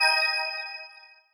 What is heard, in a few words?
create bomb; jewelpetsmatch; jewels